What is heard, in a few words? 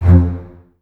BSS F 2, String Ensembles, Basses, Stacc; Miroslav Vitous; 90 s Sample CDs